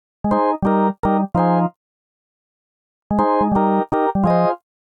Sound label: Vinyl Drawbs F Minor 83 bpm; MIDI Clips; Tonal; Accompaniment; Ableton; Factory Packs; Chop and Swing